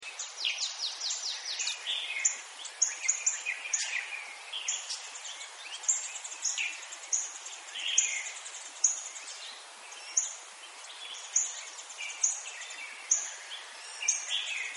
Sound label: ambient